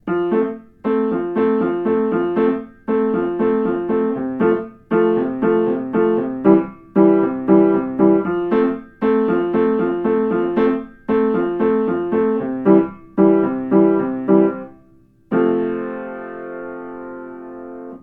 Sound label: Piano riff